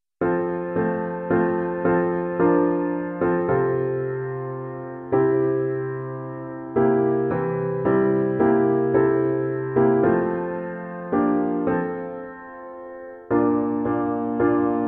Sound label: god answers prayer piano